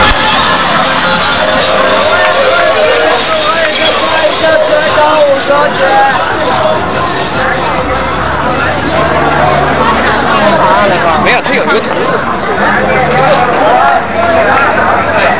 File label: market; china